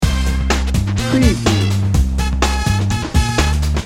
big city hip-hop loop

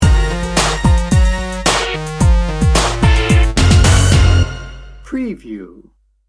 urban renewal sting bumper

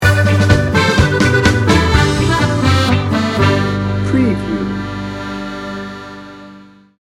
tex-mex fiesta sting bumper

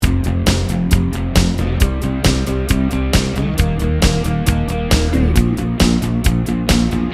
happy sunshine loop

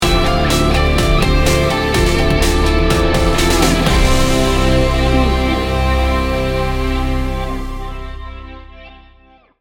sting bumper

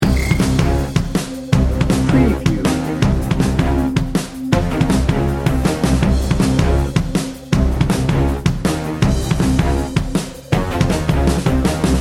war games loop

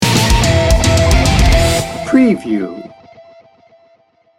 hard rock grunge sting bumper

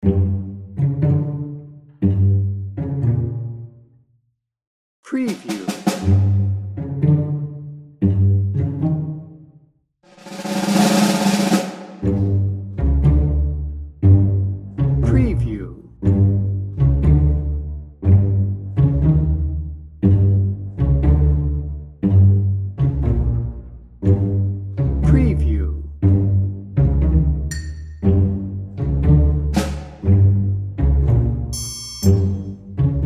cartoon adventures bass percusion

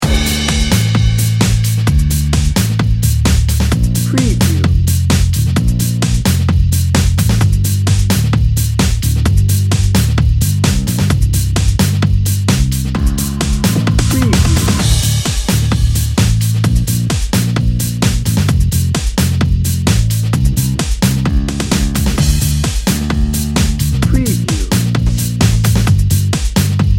latin pop dance dnb

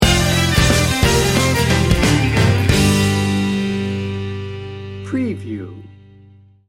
country road sting bumper

